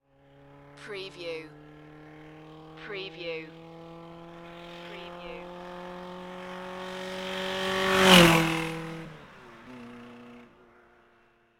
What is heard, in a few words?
Car Motor Drive By